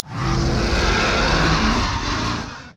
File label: Dragon